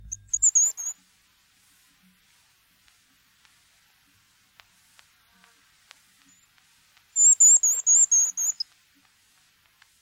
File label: Female Tomtit; Miromiro